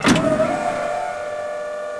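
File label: crane elevator start; machines mechanical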